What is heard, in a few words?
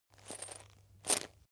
chain mail movement